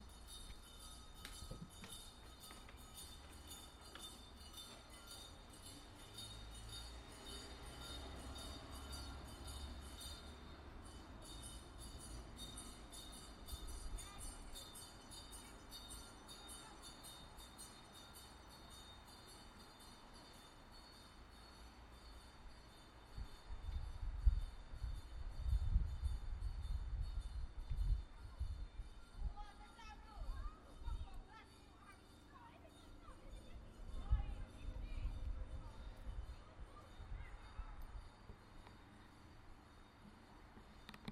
soundscape any old iron

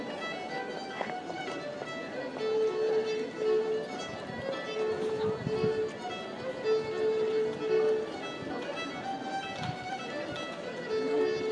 folk fiddle

